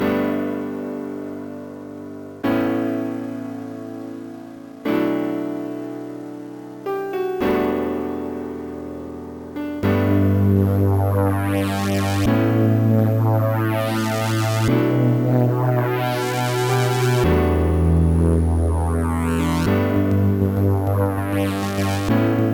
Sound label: mellow ambience; Trackers; Modland